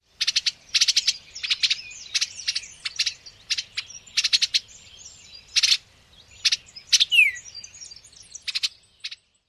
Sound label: chits alarm calls at nest